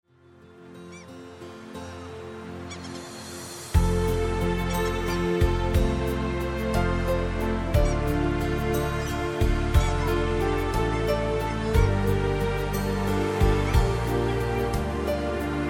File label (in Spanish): Musica para relajarse y sentirse bien